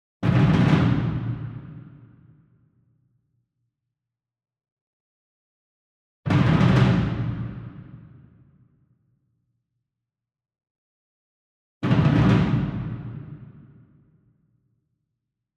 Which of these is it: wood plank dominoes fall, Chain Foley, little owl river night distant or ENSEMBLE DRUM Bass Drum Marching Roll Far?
ENSEMBLE DRUM Bass Drum Marching Roll Far